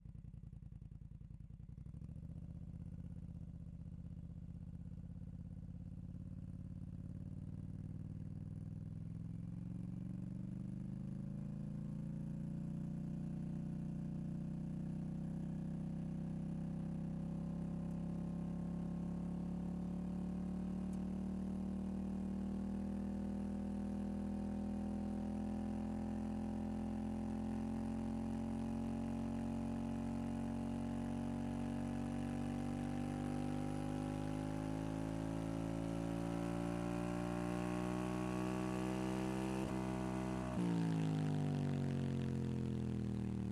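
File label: Polaris Sportsman XP1000 - onboard - RAMPS - Drive - Stop Off - EXHAUST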